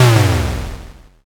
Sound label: explosion